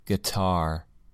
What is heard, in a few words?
guitar